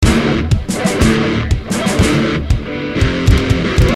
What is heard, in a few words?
good guitar solo; Strong Bad's Cool Game for Attractive People